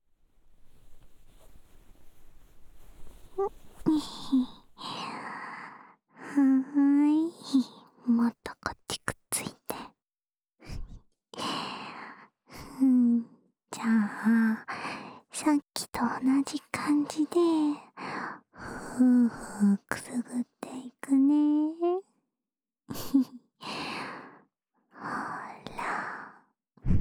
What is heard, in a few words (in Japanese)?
左耳ふーふー, ASMR; 可愛いところ、もっと見せていいよー？